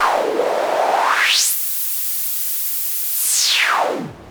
Yamaha CS5, Filtered Noise

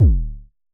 Festival Kicks D1, Drums, Vermona Kick Lancet; LookIMadeAThing Kicks

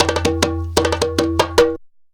sabar groove; Best Service; World Colours

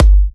G, kicks; markus hakala kicks

